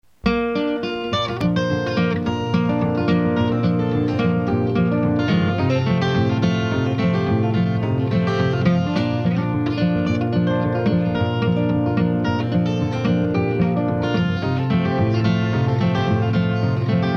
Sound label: Spanish Fandango